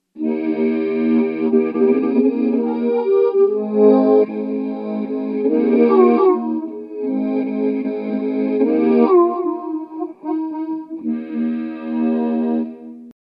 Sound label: Chorus Phase Flange Detune; DigitechRP; RP200